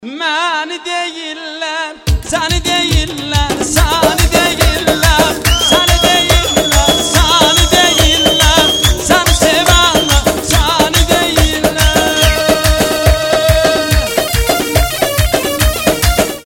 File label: Ringtone